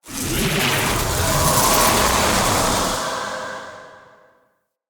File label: Upgrade; Kuva Lich; Abilities; Spawn